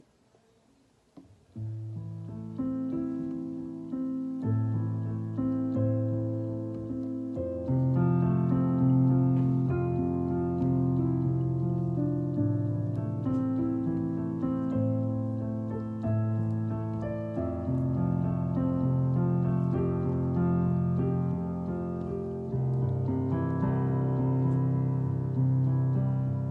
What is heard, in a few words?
piano